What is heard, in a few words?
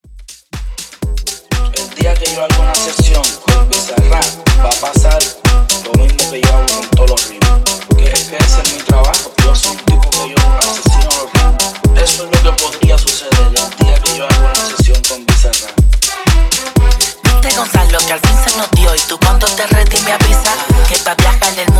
Funky House Uptempo 122 BPM